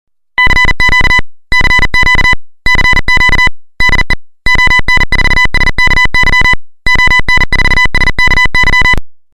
cw 40wpm

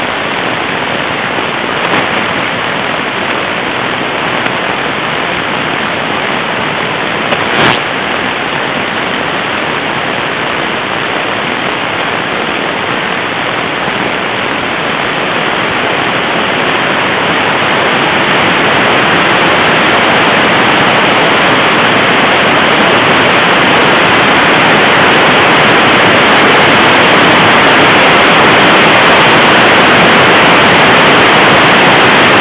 sun burst; radiojove

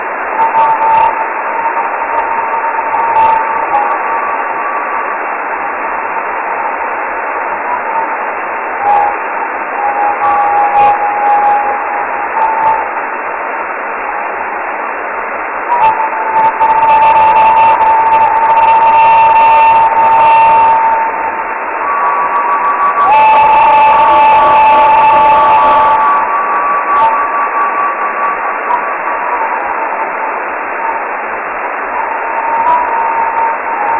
radar 67mhz

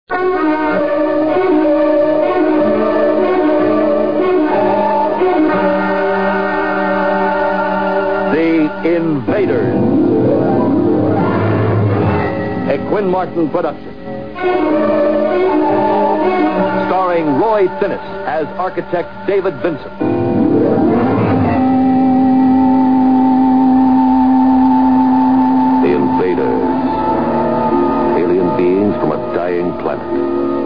envahisseurs invaders

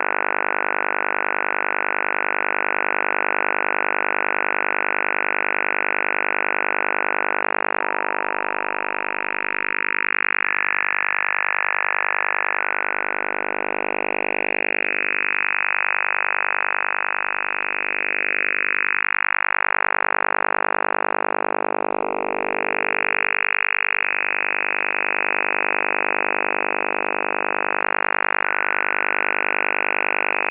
radar othr kontayner 14530khz; 29b6

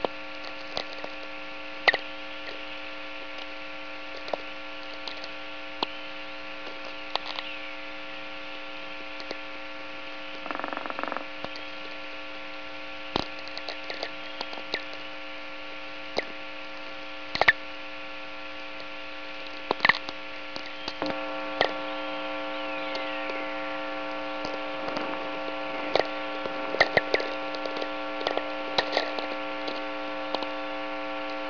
VLF auroral chorus nose whistlers, Saskatchewan

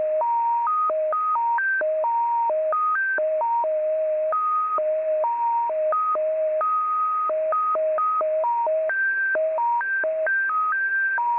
beacon jt65; shf

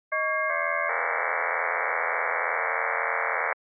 mode 15 tones

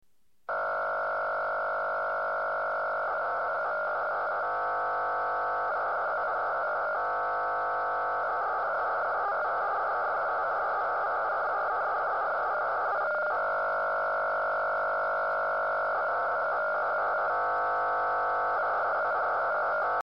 mode ao 40 psk, Radio

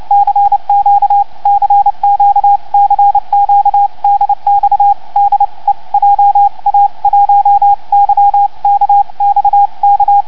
200hz

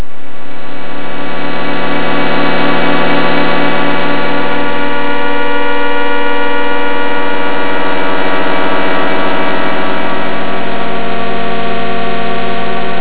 pulsar variation scintillation; 47tuc

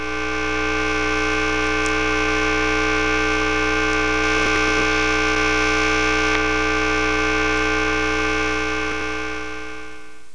VLF 60 Hz city

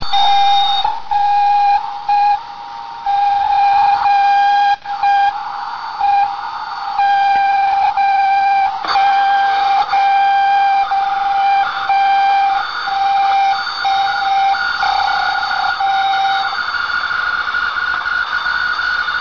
CW PDM; cosmos186

